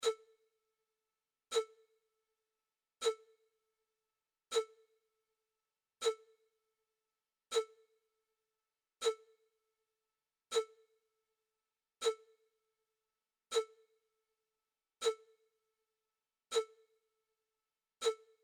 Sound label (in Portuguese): amostra de pulsos com periodicidade